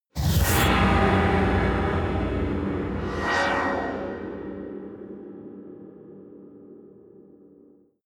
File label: whoosh scifi hit